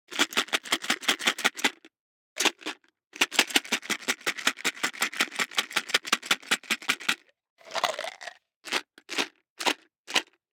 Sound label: DICE in Plastic Cup Closed, Shake, 16 Sixteen Dice; Samples; ArticulatedSounds; DICE Sfx Party (Extended)